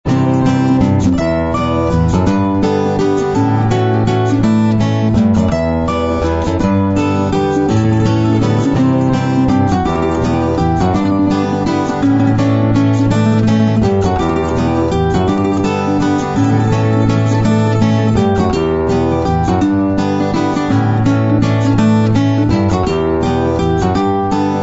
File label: Appalachian Air